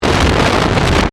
Distortion Wipe

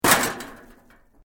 METAL LIGHT CRASH; Materials

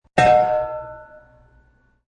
Big Old Bell Single; Musical

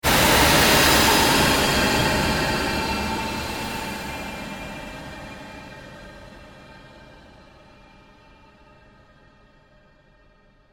Created Pulse Fade, Rise And Dive Sound Effects

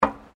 FOOTSTEPS WOMENS LOW BOOTS ESCALATOR STEP; Footstep Foley; Human

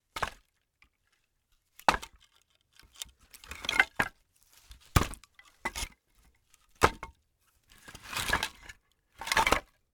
Firewood Stack, Foley, Firewood, Stack, Pile; Fireplace Sound Effects; Household

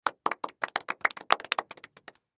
Human Crowd; Crowd Applause Small Group Short; Crowds; Blastwave

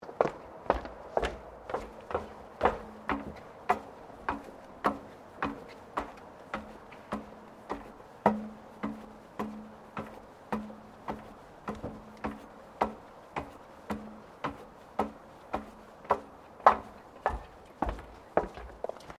FOOTSTEPS WOMENS LOW HEELS ESCALATOR SEQUENCE

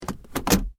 Hyundai Accent Auto Gear Shift

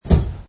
Release, Servo Panel Sound; Buttons; Multimedia

